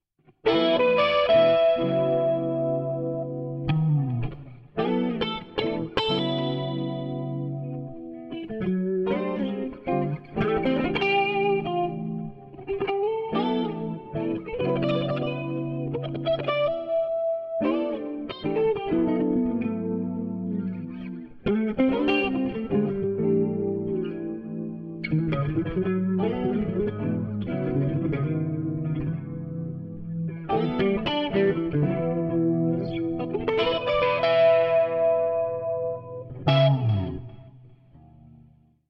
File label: Jazzee Moteef